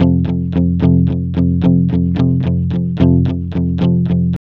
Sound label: ROCK F, Vintage Blues Guitar; USB Soundscan vol.22; AKAI